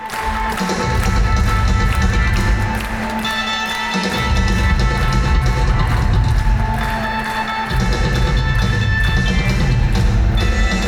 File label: Drum Solo; Royal Albert Hall; London; 20th March 2024